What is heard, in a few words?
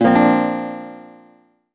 Notifier Morning, BlackBerry 9000 Bold